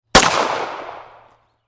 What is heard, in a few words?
9mm pistol shot; Fire Weapons Sound Effects